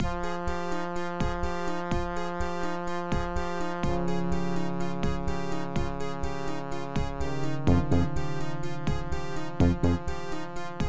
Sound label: OPL3; Raptor: Call of the Shadows; MS-DOS; DMX; Wave Music 2